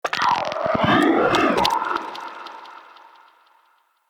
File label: Shrimp Vocal Alerted, Creatures; Baliq Shrimp Glade